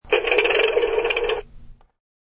Old-time toy plane attempting to turn on, antique sounding.; Toy Planes; Toys